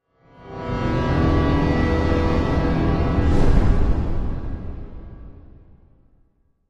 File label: Music Eerie Low Bras, orchestral